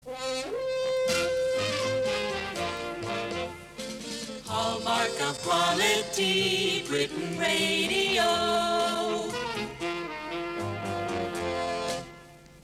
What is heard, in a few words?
jingle Hallmark of Quality; Britain Radio